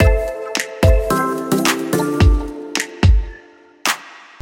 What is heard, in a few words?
loading music; Equalizer